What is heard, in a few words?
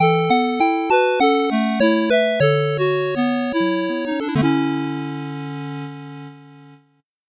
8bit jingle normal